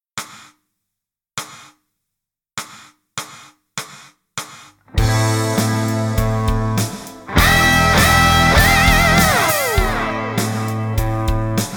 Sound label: Piano Forte